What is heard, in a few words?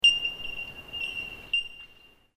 bellbirds